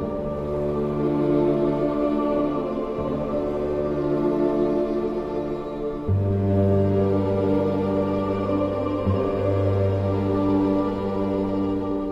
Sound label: strings; Sad